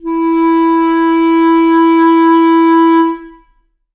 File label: reed acoustic, clarinet